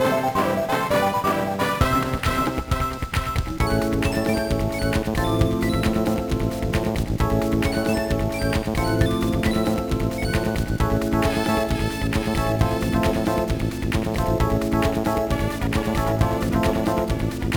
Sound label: BGM; WTA Tour Tennis 2002; GBA